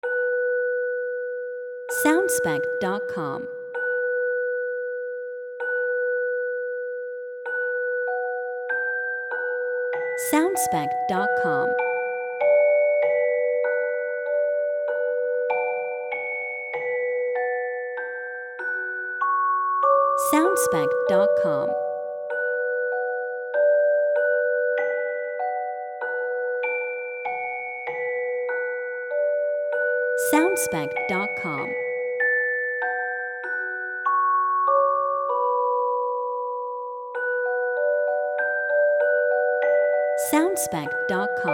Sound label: Music Box Nostalgia